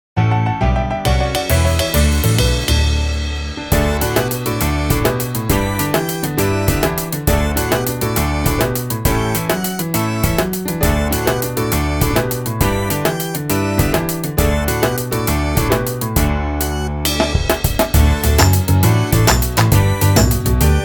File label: backing music